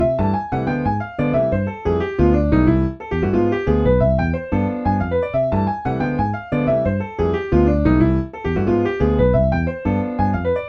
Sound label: maj6 diminished bebop harm